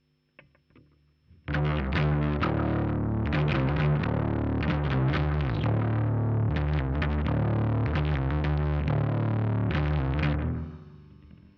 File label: Bass DI